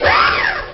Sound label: heavy bot move